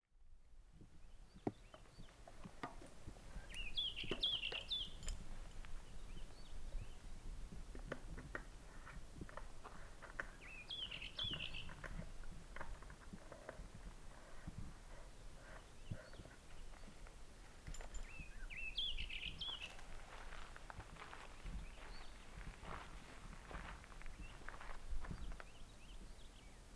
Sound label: Hume's wheatear